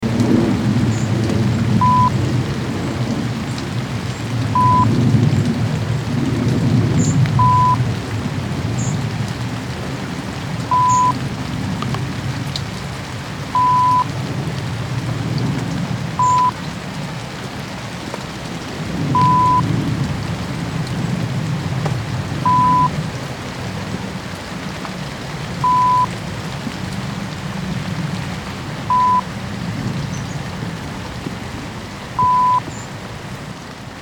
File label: Under Tree In